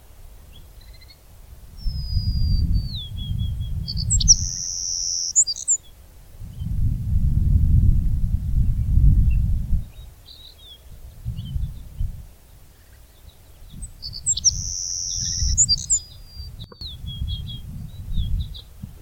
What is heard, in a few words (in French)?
bruant des pres